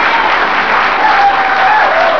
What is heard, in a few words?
CLAP WOOP; PEOPLE